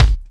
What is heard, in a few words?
snappy kick drum a sharp key, kickdrum sound tuned to the A# note. Loudest frequency: 586Hz; kick drum samples